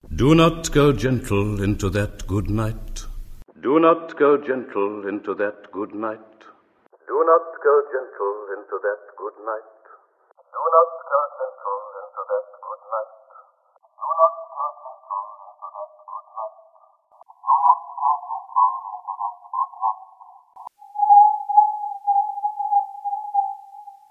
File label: filter